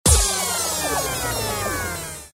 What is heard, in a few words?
trap